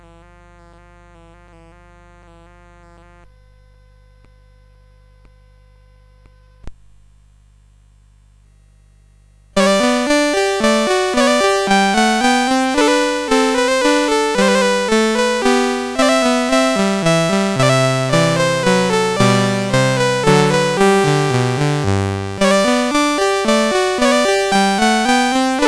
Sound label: CSG8580; Two Minuets in G Major; CSG8580R5